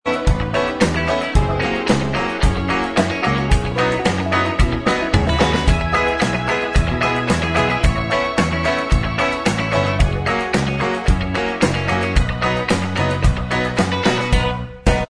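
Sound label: instrumental, backing tracks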